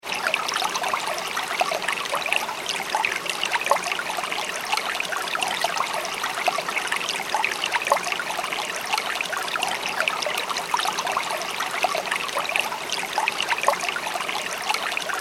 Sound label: water stream light trickle; River; Nature; McKinney Sound